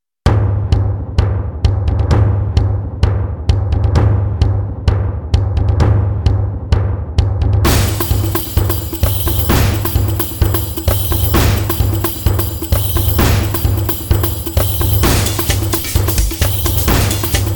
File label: matsuri dance